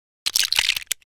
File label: smash